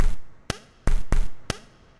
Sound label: IDM Perc, IDM Percussion